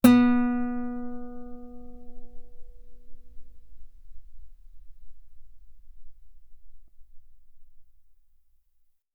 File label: Guitar ff sul B B3